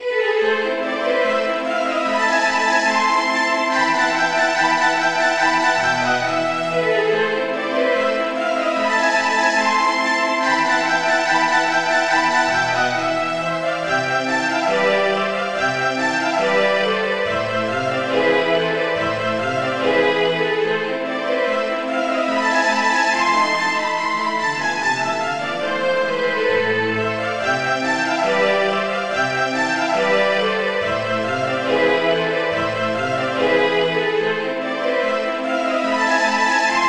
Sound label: tremolo